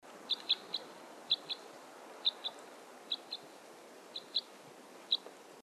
red crossbill flight

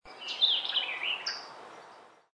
white-eyed vireo

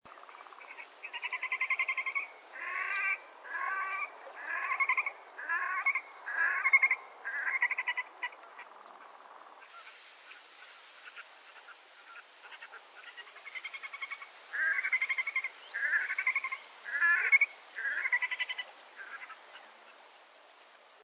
red necked grebe

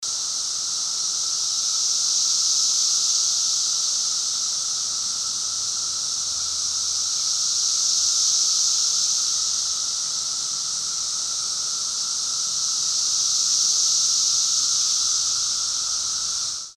cicada afternoon

